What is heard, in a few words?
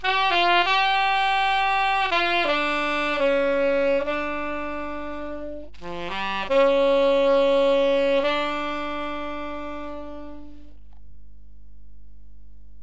sax